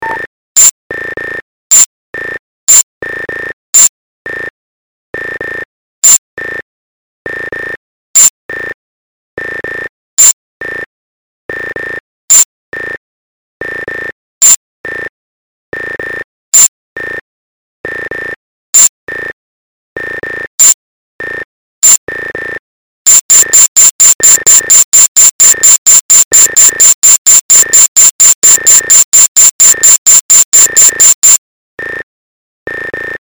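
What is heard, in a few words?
sine waves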